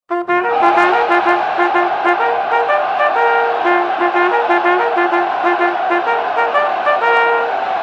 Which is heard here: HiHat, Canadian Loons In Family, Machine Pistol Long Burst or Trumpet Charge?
Trumpet Charge